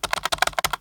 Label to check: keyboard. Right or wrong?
Right